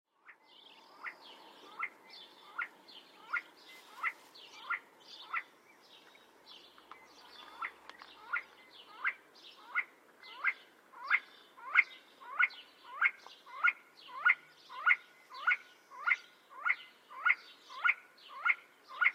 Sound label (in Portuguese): Canto do see see partridge